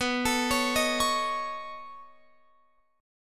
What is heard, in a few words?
B 9th B down Guitar Open F; arpeggiated